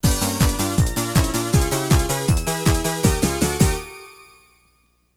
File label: Battle Mode Defense Victory Tune; Hebereke no Pair Pair Wars Sound Collection